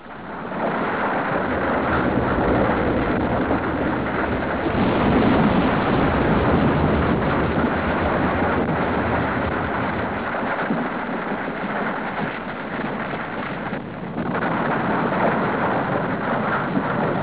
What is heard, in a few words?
volcanoes